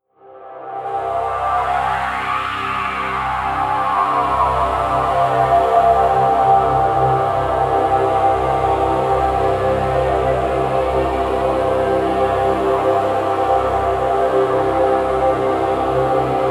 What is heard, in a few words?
pad sweep choir; flaechen